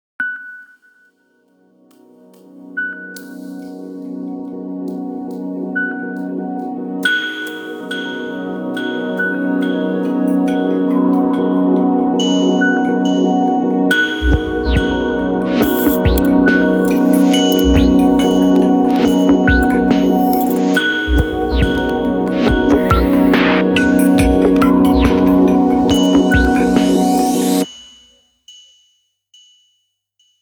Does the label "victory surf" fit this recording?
No